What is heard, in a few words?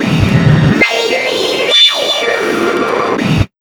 Big Beat Drumloops, SPEED 140